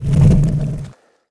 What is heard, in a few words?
ICECUBE CAST